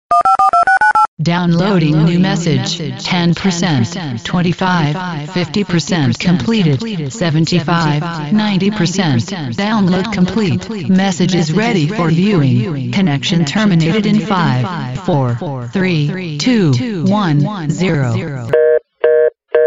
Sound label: downloading message